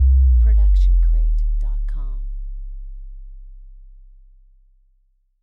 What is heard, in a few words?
synth fall; soundscrate